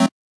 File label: Chord